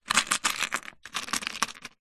industrial tool box plastic compartment screws nails etc rummage